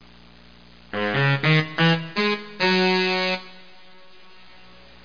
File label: SAX; Broderbund Print Shop Multimedia Organizer Deluxe Edition